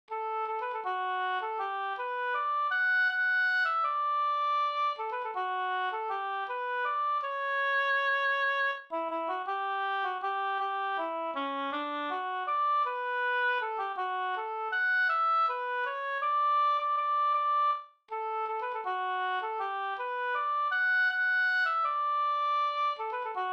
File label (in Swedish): vals D dur